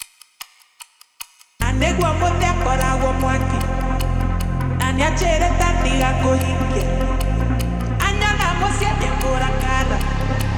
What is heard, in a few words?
techno